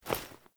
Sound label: Female Foley Action Default Melee Swipe, Female Default Clothing; Characters; Player